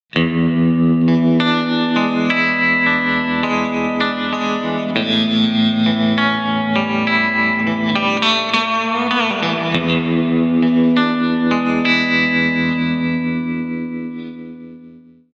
black panel tremolo; cube 30

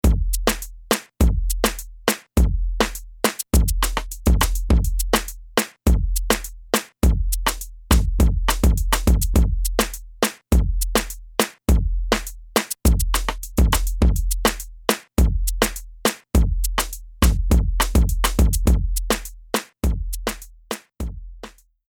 beat

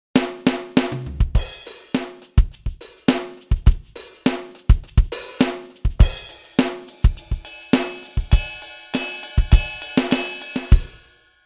drum set; SPD 20

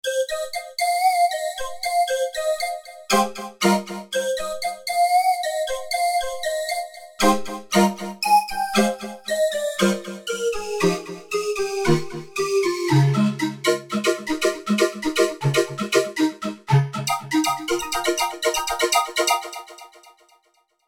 calliope; VC 1

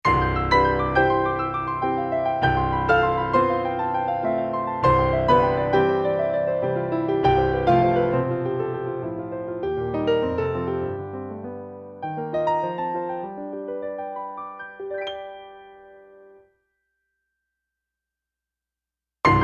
hammer voicing; V-Piano

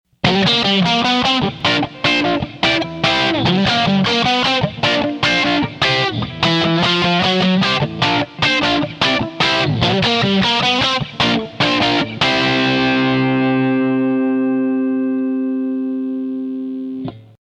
fire

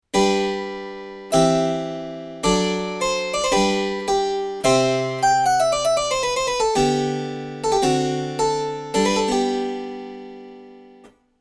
harpsichord

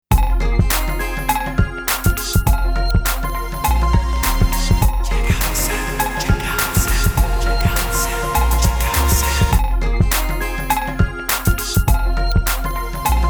sp-404sx effects mfx reverb

